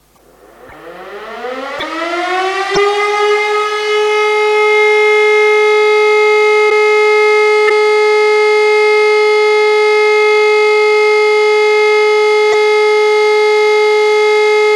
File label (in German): sirene Entwarnung; feuerwehr; sirenensignale